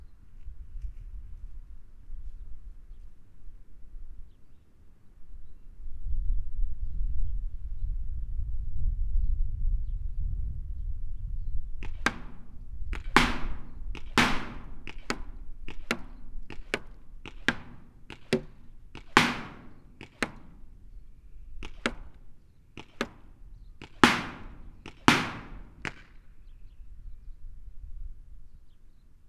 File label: splats chickenhouse longbarrel